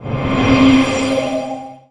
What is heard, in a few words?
Skil Psy Blunt Sw